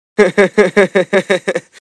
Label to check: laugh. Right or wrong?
Right